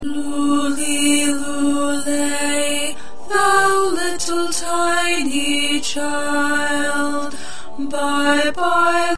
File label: Alto